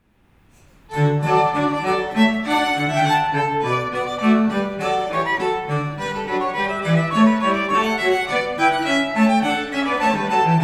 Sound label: marche